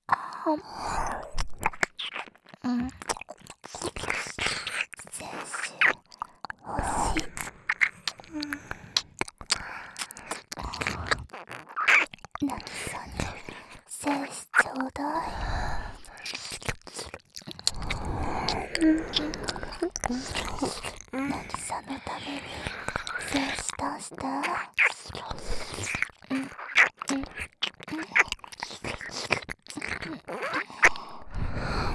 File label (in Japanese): 耳舐め; 精子ちょうだい♪